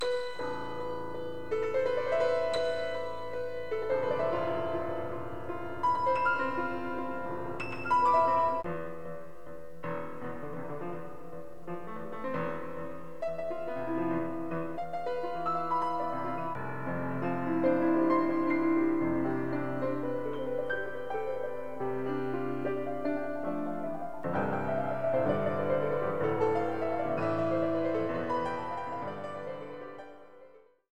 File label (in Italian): suoni estremi, Piano